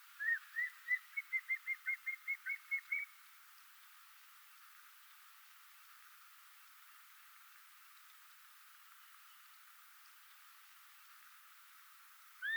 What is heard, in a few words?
Common Black Hawk call; birding in se az; specialty birds in se az